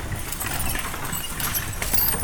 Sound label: Horse Drawn Wagon By; Electa Quinney